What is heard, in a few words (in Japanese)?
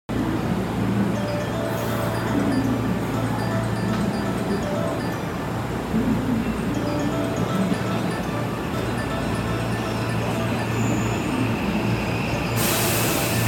大阪環状線接近メロ、天王寺で録音